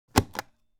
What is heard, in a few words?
Self inking stamp pushing down once